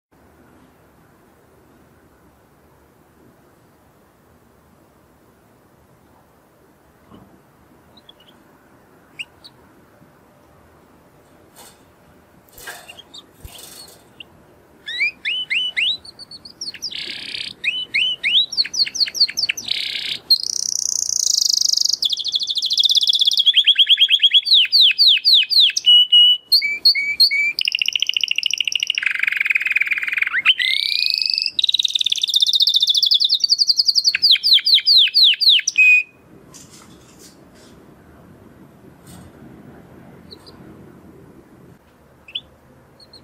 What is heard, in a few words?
Canary, Bird Songs